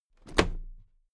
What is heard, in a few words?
toolbox close drop lid heavy